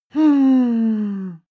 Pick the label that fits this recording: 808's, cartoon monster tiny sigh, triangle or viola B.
cartoon monster tiny sigh